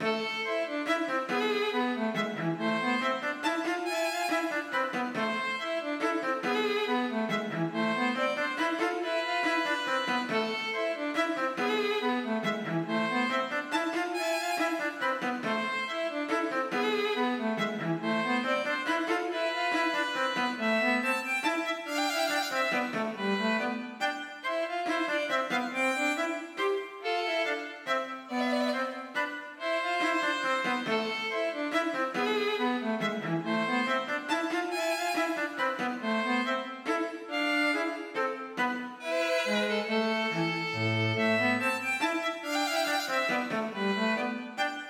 Menuet for fiolin og cello; Aamodt

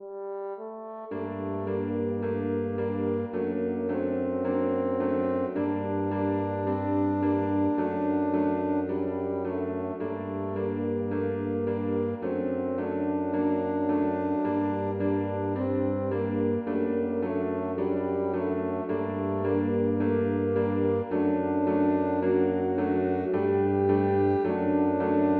Aria for trompet og lite ensemble; Bang

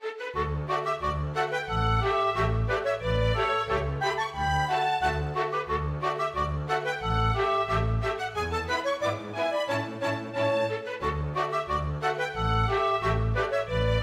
Polka; storgårdsmusikk

